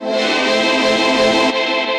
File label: Deep Choral, pads; Ableton; Skitter and Step